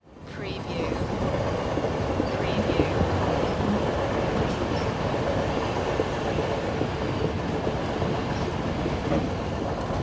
Passenger Train Ride

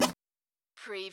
CARTOON SQUEAK

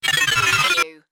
Scifi Production Element - Shortcut Pass

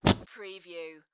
Whoopee Farting Blowing Raspberry Sound

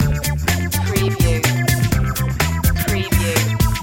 crazy kids loop no guitar

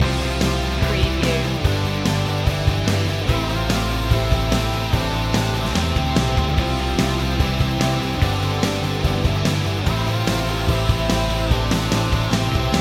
rockin loop

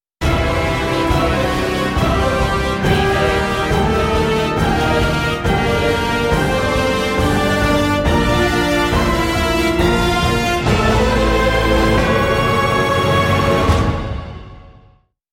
wolf vs vampire

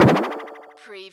CARTOON TYMP BEND WARBLE

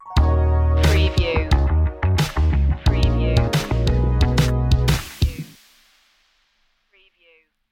night ride stinger

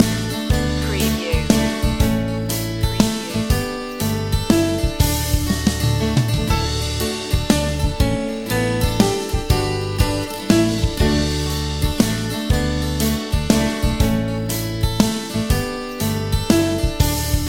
happy birthday to you instr loop

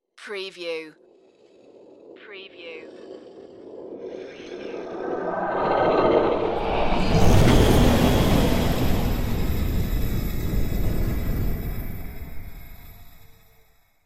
evil dark rising

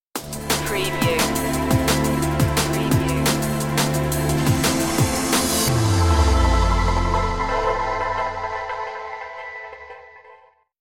drum n space stinger

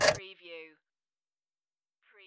Pinball sound, motor or crane move short

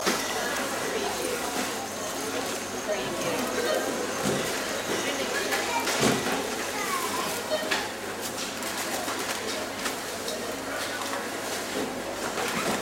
supermarket ambience

